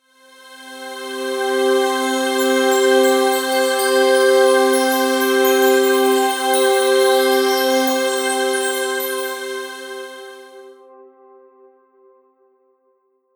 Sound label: promo pad